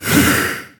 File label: axe; Fight Knight